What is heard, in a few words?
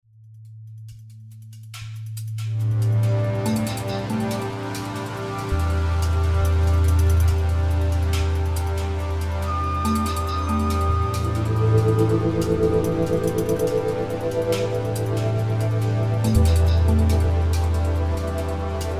Energizing Ambience